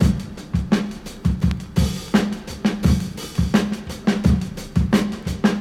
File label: 86 bpm hq drum groove f key, Free drum loop - kick tuned to the F note. Loudest frequency: 572Hz